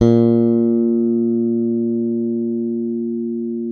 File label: A#2, FINGER MUTE; Xsample VOL-1